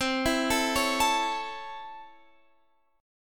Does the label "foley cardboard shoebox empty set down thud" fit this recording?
No